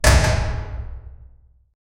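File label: heavy dungeon door slamming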